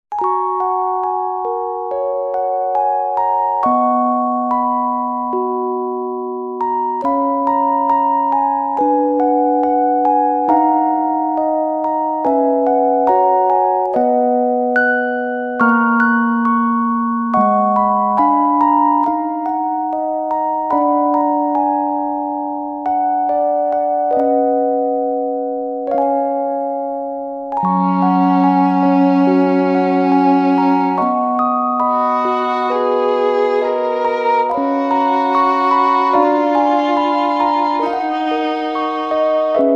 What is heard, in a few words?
bgm